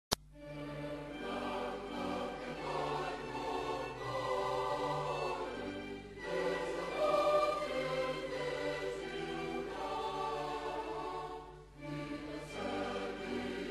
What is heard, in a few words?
National Anthem of Andorre